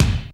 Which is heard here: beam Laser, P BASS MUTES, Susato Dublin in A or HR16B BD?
HR16B BD